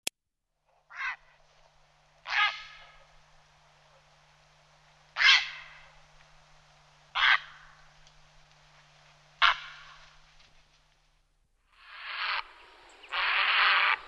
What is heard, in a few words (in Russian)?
seraya czaplya kriki v polete golosa na gnezde zvuki kolonii i golos ptencza